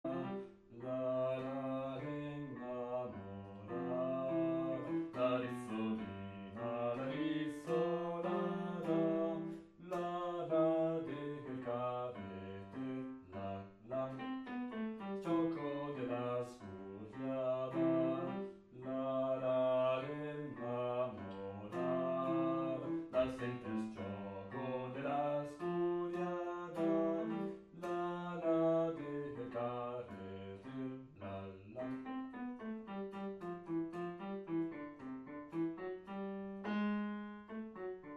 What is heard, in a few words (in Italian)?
El Careter Bassi